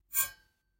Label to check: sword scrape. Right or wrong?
Right